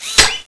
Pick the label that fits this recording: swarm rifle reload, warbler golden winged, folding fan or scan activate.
scan activate